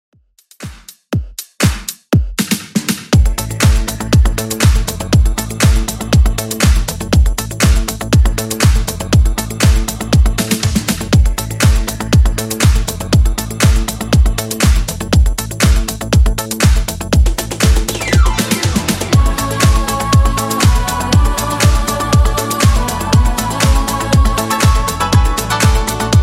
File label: Club Redrum 120bpm; remixplanet